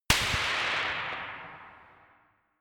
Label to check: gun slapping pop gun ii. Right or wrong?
Right